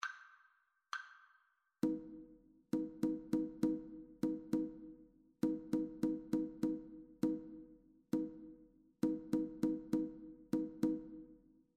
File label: rhythmic dictation; ear training